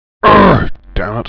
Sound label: assault pain; svencoop; portal house